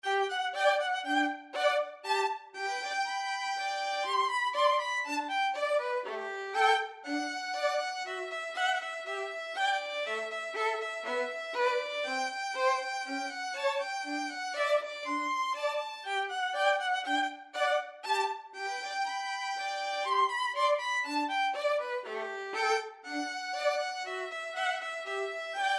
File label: reed pipes, violin duet